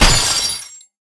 cowboy

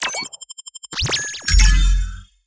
ui battlestart

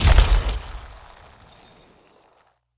ice spread

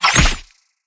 loyal sword

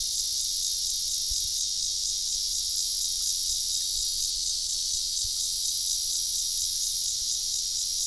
summer cicada

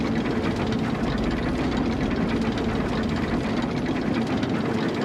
cable car loop

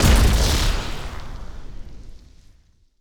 emp

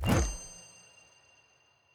cello

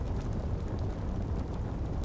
burning loop

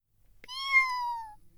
classic biu

